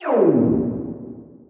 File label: fail; Techmino